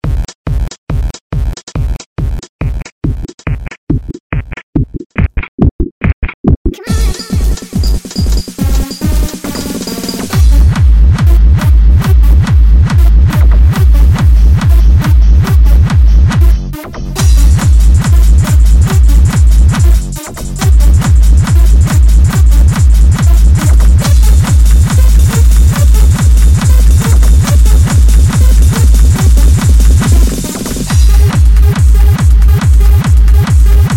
Hard Trance

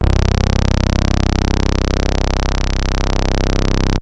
Fat Bass Lick

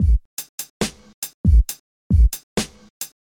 Laid Back Beat

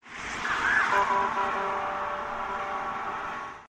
Police Passing

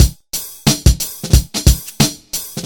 Hip Hop Groove

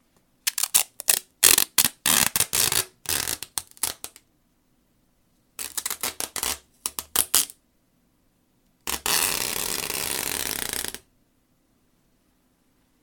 Packing Tape

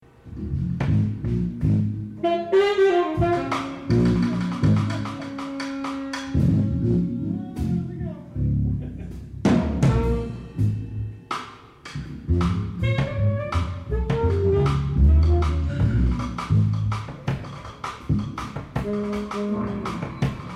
Jazz Band Warmup

